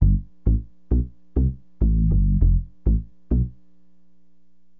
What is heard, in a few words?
SYN BAS, Acid Jazz Funk; Bass